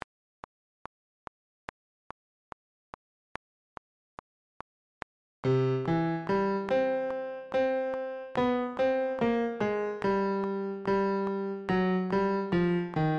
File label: Baritone Piano Track; Fiddler Auditions